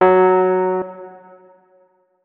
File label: notes, keyboard, electric piano